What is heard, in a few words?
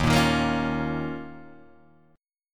D sharp 7th Suspended 2nd, Guitar, Open D